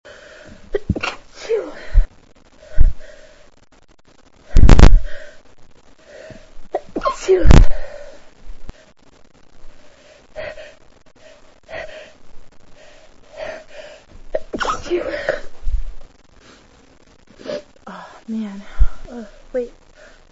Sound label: stifles, female